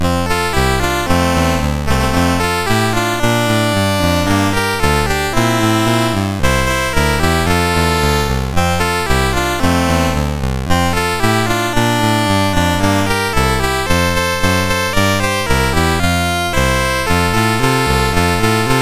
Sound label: Jingle Bells, MOS6581R4